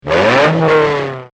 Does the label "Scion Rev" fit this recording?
Yes